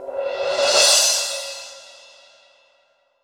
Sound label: Cymbal Roll; Roland BK-5